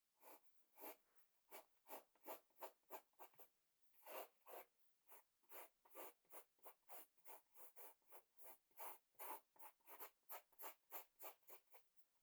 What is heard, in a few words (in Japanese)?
鉛筆で絵を描く, ASMR; 動作系